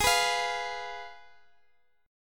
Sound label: Ab Minor 6th Ab, Guitar Open F; strummed; down